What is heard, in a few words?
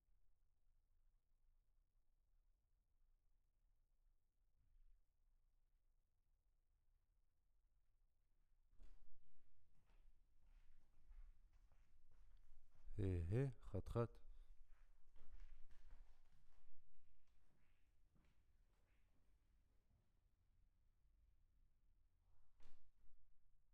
VOC GUIDE